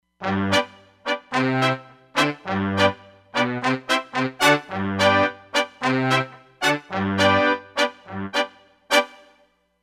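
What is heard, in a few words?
Pop Brass with attack, Roland Fantom XR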